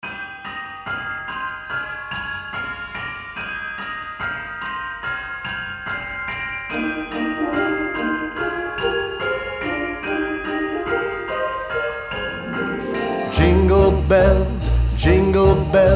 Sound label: Jingle Bells vocal version